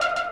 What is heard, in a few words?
synth; osu